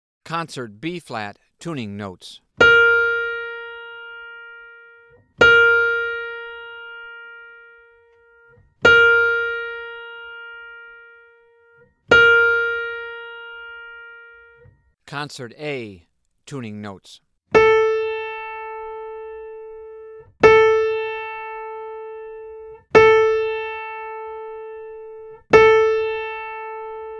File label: Tuning Notes